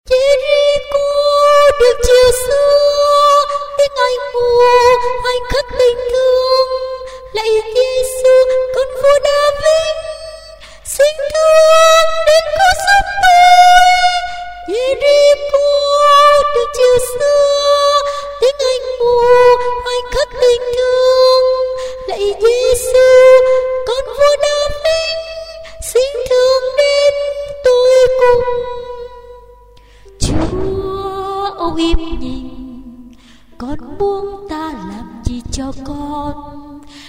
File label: basso